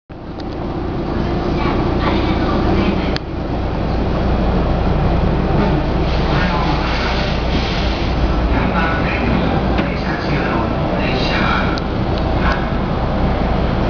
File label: e653, train motor sound; tsuchiura-ushiku